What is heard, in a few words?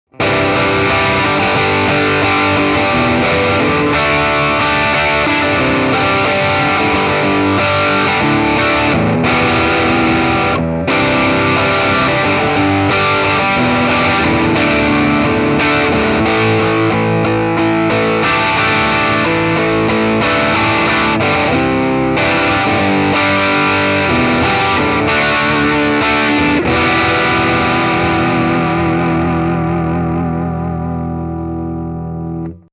Joyo Preamp House UK 30